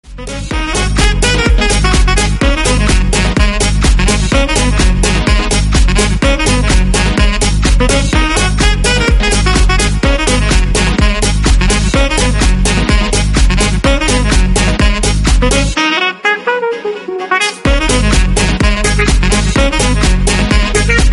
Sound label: Blow Your Sax